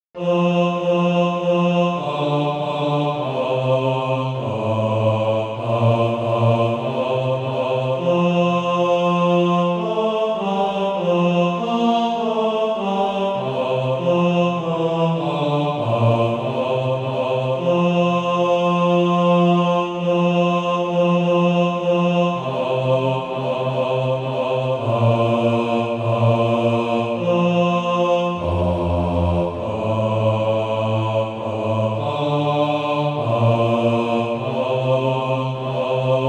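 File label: bass; From Egypt Lately Come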